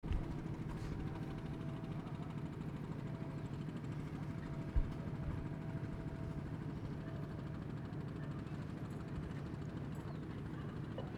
Morning engines, North India

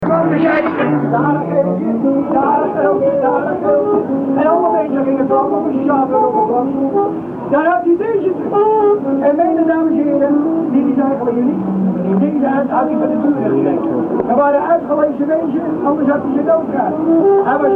shrine dictorphone mexico, street recording

